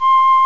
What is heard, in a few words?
slow flute